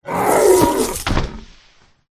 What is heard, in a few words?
death; bargof